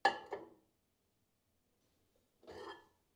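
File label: plate into the microwave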